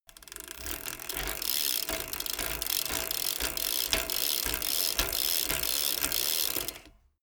bmx bike pedal accelerate

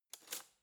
cutlery spoon drawer grab

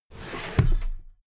drawer cabinet paper close heavy halfway

